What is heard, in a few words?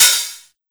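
hi-hat, Drum & Bass, 175 BPM; Ueberschall; Drum & Bass Flash Trackz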